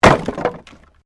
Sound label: Wood Movement Heavy; Material components for constructing more complex sounds; Materials